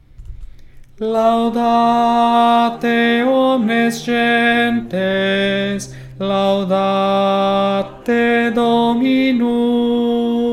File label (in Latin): tenor